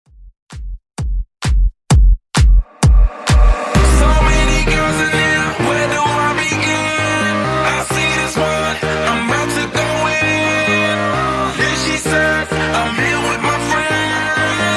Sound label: Throwback Redrum 130 Bpm